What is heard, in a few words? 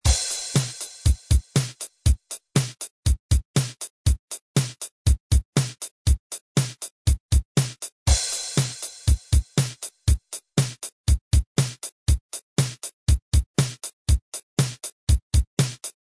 example four four time beat